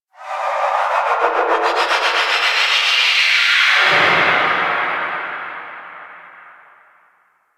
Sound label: Undercroft Shriek Tonal Verb; Ambience; Warframe; Duviri